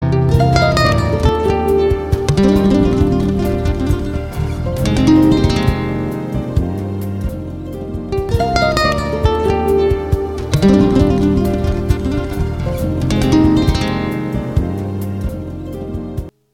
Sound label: Harp Sound